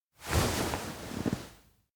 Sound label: futon stretch; anemoi パッケージ版 1.1